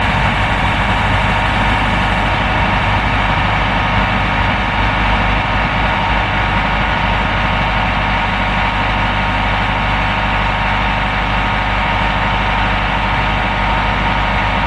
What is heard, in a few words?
car engine